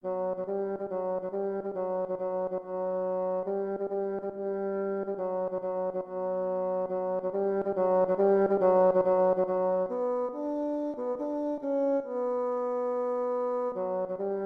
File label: soon ah will be done tenor part; part 1